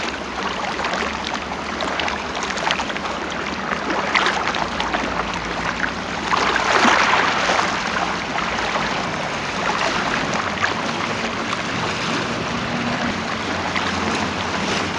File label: Sea Ocean Waves